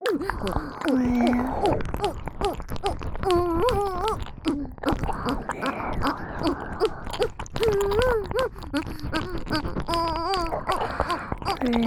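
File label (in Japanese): オホ喘ぎ＋ぐぽぐぽW耳舐め; 由紀＆華恋; 3WAYループ; SE有; おまけループ（オナサポ）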